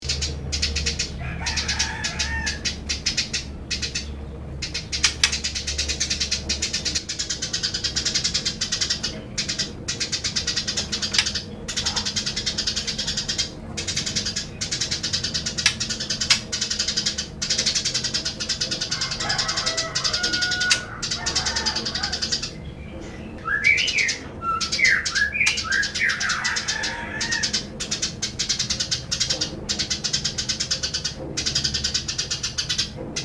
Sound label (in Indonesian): burung gereja jinak nyrecet panjang panjang